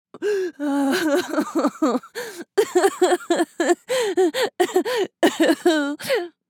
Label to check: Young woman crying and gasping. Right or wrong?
Right